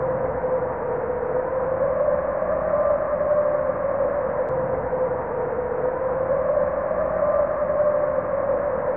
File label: suck